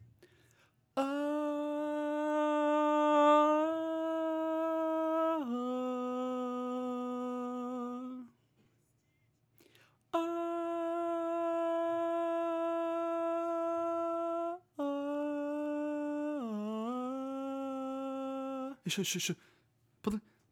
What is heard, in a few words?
tenor